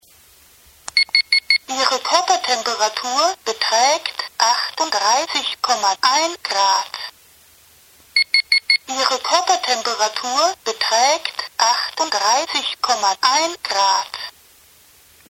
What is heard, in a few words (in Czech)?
teploměr lékařský